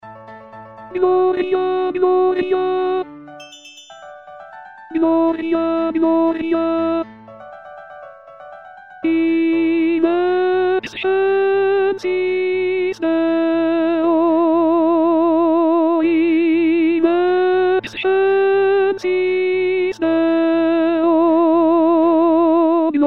gloria vivald alto

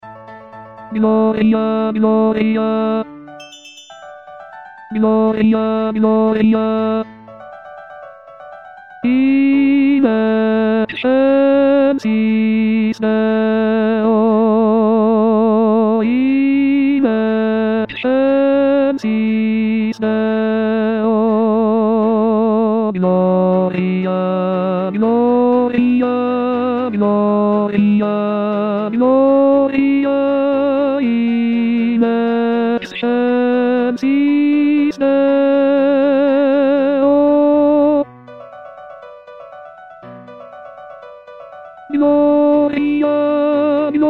gloria vivald tenor

